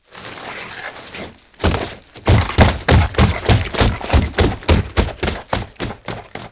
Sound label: Monster Footsteps Fast